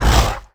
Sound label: golem stone attack; Crypt of the NecroDancer